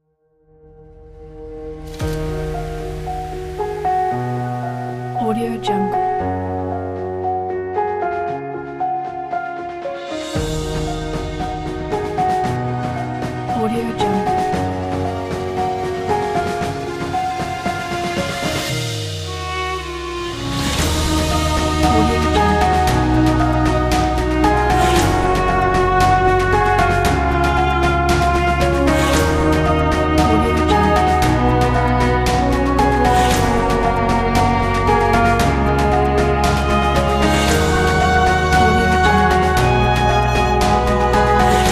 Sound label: dramatic emotional cinematic trailer; edwardfo